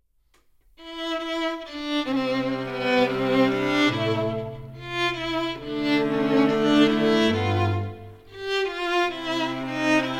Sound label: Trio